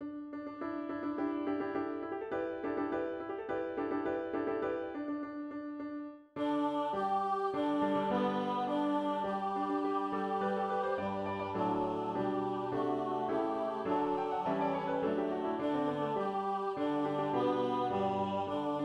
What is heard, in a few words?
hymne national australie; mccormick peter dodds; advance australia fair